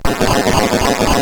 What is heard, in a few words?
Boing, CSG8580R5; hvsc